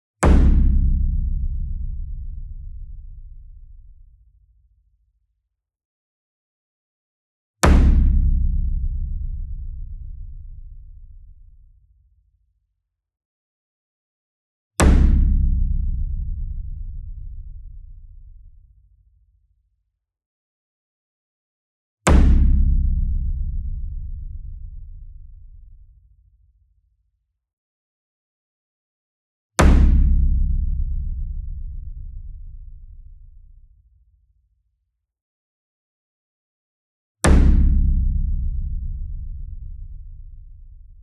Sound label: SINGLE DRUM Gong Hit Hard Beater Near; boom library; Cinematic Strikes Construction Kit